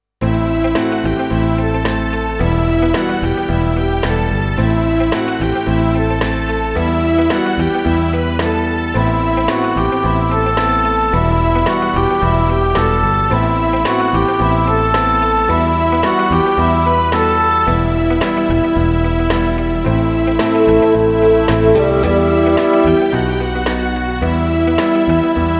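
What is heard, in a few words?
Em Riff pop ballad English horn + fagott 110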